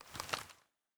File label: thompson deploy, weapons; Tommy Gun Drop